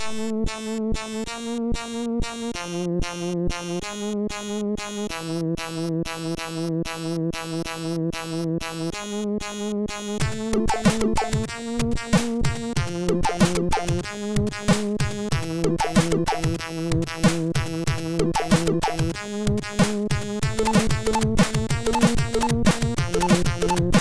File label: Hardcore, MOS6581R2